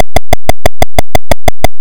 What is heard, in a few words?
walking; skool daze; This tune is played by the routines at 32390 (just after the game has loaded)